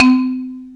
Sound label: Marimba